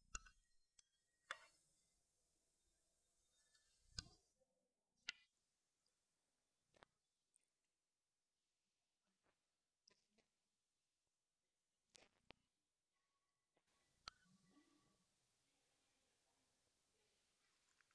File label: 5th Floor Female singing; WOMAN SINGING; Morton; VOICE ISOLATED